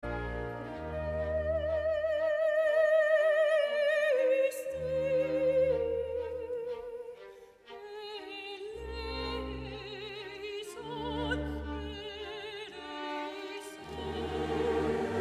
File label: Kyrie solo; Messe ut Mozart